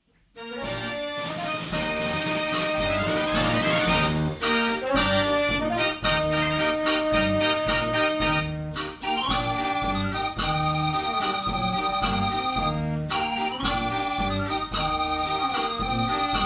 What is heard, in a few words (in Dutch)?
draaiorgel; de kaaspoorter no 3